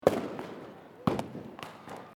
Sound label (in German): Silvester Knaller